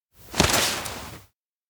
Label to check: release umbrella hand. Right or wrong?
Right